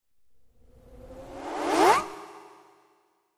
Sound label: Synth Laser Zap By Pan, zaps; By, Panned With Buzz.